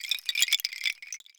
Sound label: WHIPPET, African perc; Greytsounds VOL-1